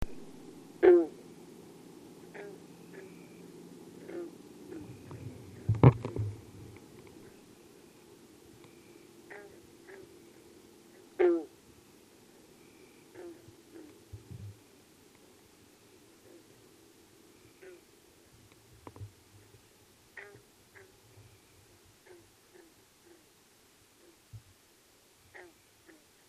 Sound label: bullfrog